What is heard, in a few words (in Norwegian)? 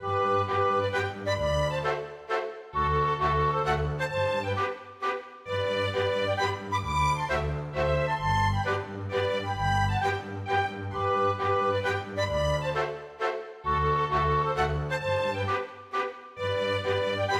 Polka Masurka; storgårdsmusikk